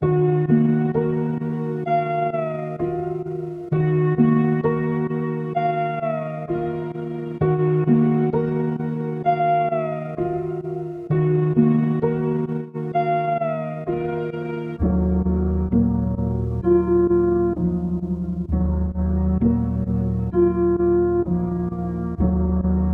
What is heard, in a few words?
130 BPM D# Min, Melodies; Cymatics; 2022 Melody Mystery Expansion